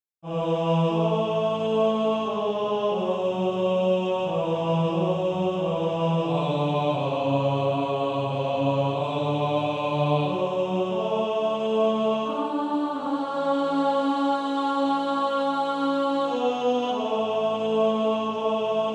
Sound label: Male Choir; Christ Liveth in Me; 2nd Tenor